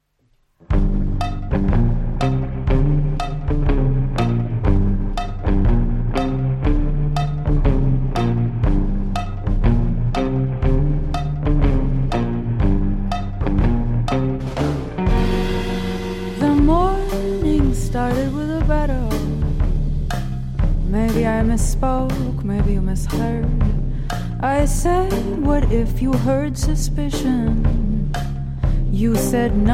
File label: Tango, Waltz